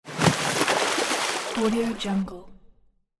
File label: water splash large impact